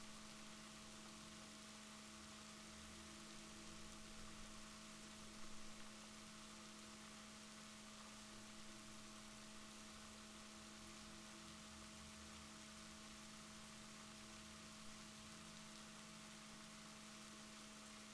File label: rorquals negatif, Balaenoptera Physalus Chile